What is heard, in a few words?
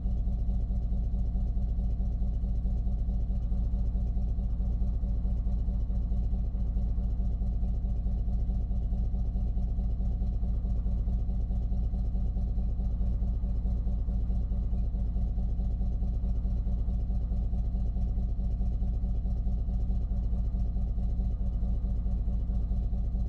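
Chieftain Onboard Idle Steady Exhaust Mix, tank; 60's; Pole Position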